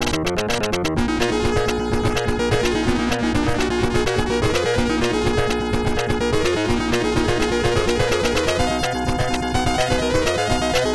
Battle Phase 3; zan yasha enbukyoku genesis

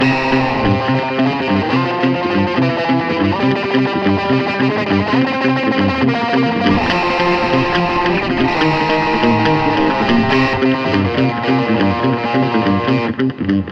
Aggro Loop; Outdoors Old

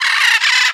Bird Cage Idle; angry birds rio

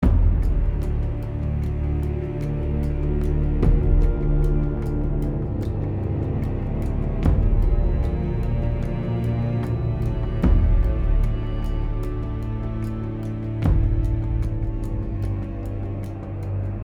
Tense Loop

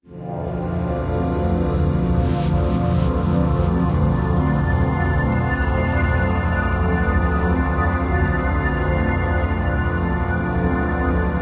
Dungeoneering Floor Track

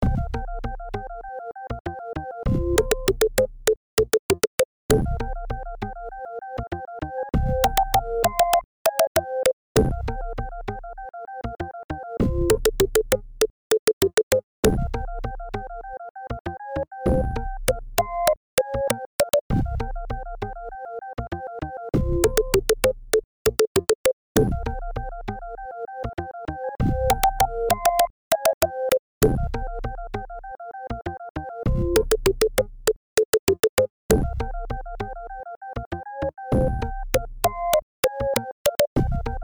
Warning; Tintin in Tibet; genesis

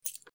Lock Pressed; my cute commissar 2020 gamerip

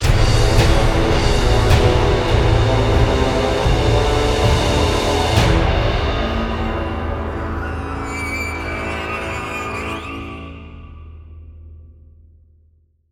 Fire Death; Fire Jotun Death

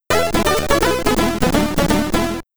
Unused (Game Over)

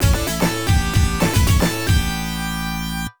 Versus Match Over; pac attack pac panic genesis

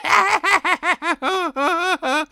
Laugh

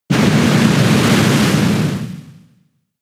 Whirlpool turn damage; pokemon sfx gen 6 attack moves xy oras

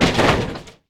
Demolish Metal Quick Stereo

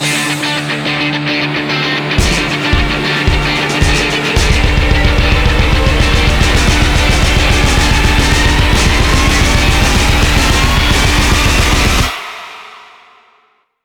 Zipline Phrase C 110bpm; battlefield hardline; ps3 ps4 windows xbox 360 xbox one

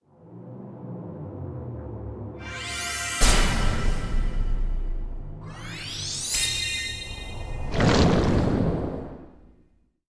alarm

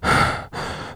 Fatigue Light; 1916 der unbekannte krieg gamerip

